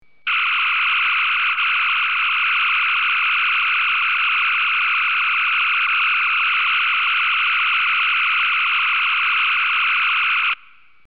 phaser

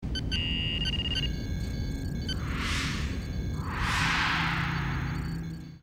hologram off

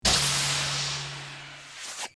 door close; tng

